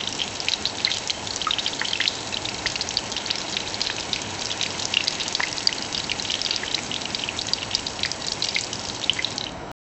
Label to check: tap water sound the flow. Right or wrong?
Right